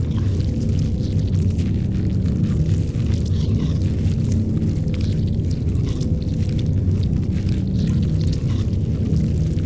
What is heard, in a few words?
ant ship titan idle; Stellaris; biogenesis; ships